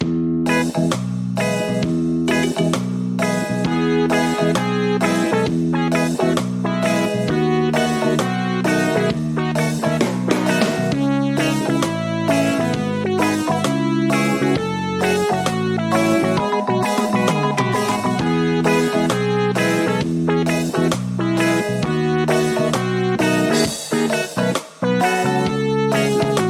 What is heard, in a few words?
Tick Tock Reggaeson; Idol Showdown Original Soundtrack 2023